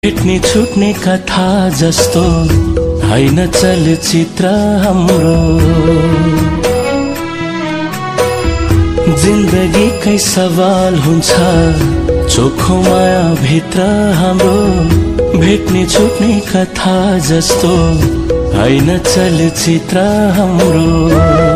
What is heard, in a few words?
nepali aadhunik heart touching ringtone